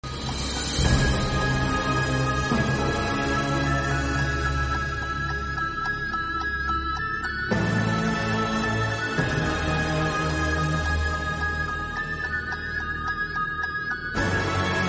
opening theme intro bgm; loki

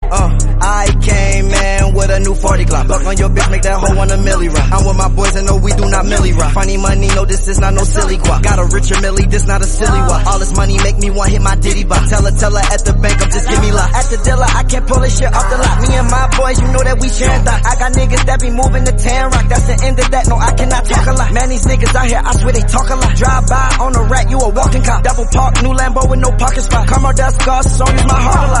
bgm english

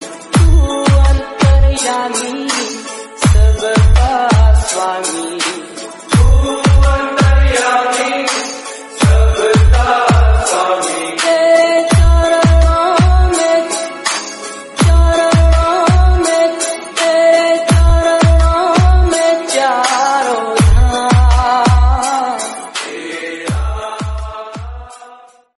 hindi song

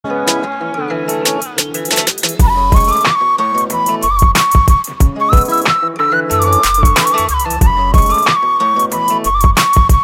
Flute Ringtone

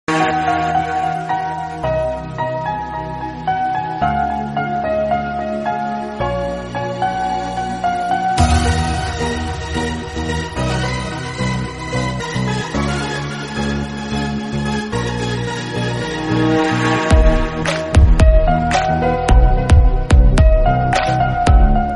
english instrumental

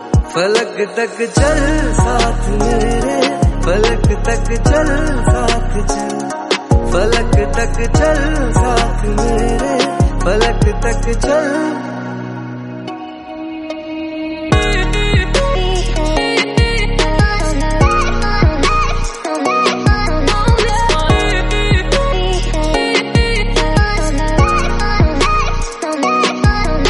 instrumental flute

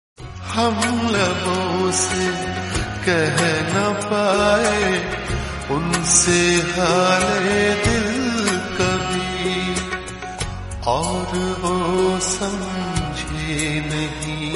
gazal hindi

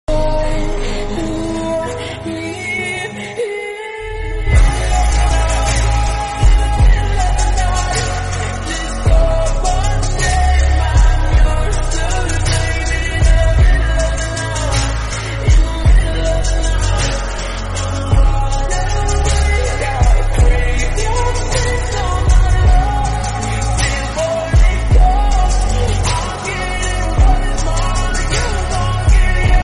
lofi english song

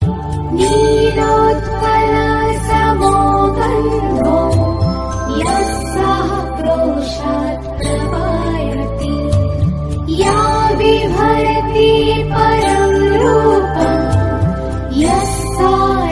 theme bgm; mahabharatham; draupadi

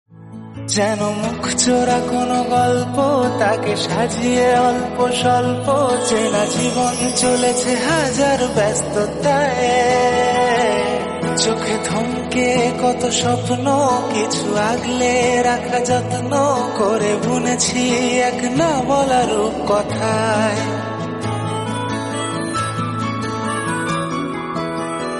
serial title song bgm theme remix lyrics tamil; indrani serial

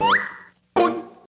pop sound; looney

